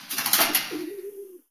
Bird Trapped Metal; Zomboid 41.78.16; Project Zomboid

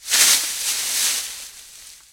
bushes; Zomboid 41.78.16; Project Zomboid

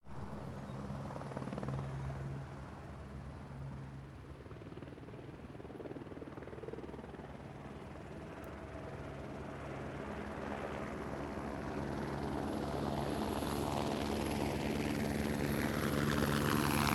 chopper; Zomboid 41.78.16; Project Zomboid

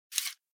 9mm Clip Eject; Project Zomboid

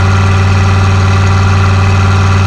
slow

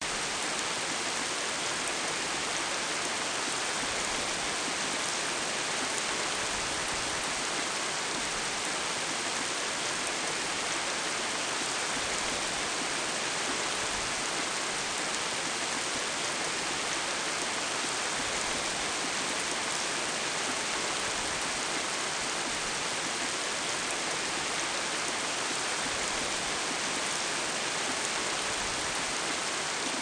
rain; Zomboid 41.78.16; Project Zomboid

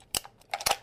Rifle Insert Round; Project Zomboid

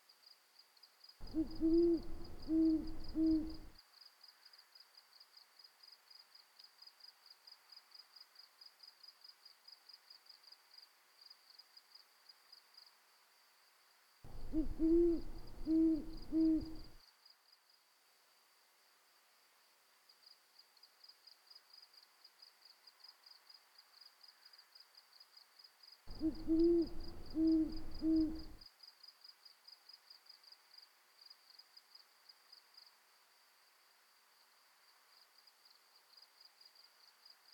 ambient Outside Crickets Owl Night; Zomboid 41.78.16; Project Zomboid

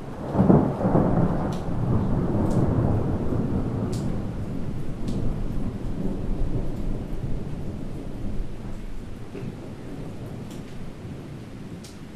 rumble Thunder; Zomboid 41.78.16; Project Zomboid

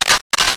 Shotgun Pump; Project Zomboid

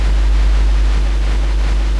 Noise; Ableton Live 10 Suite

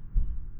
footstep upstairs; Zomboid 41.78.16; Project Zomboid

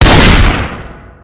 shotgun; Zomboid 41.78.16; Project Zomboid

